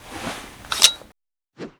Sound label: Inf Weapons Foley; Red Orchestra 41-45